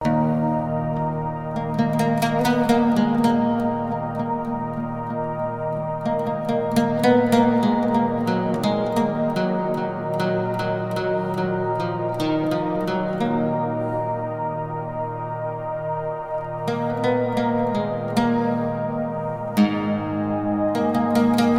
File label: Middle Eastern Oud Loop; MIUI12